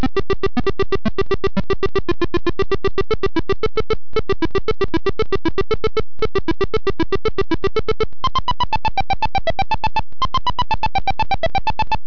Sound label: door door; ドアドアmkII; V1Sモード：mkII音源; スタート〜プレイ中; ＰＣ８８０１